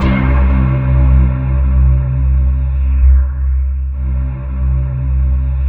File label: C2, SynthLine; 90 s Sample CDs; NiceBeats; SynthLine VOL-2